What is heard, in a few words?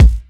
fat kick A key; kick drum samples; drum single hits one shots